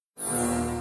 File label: match 4; john carter; lost symbol